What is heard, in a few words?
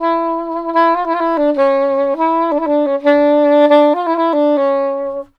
SAX, Balkanish Traditions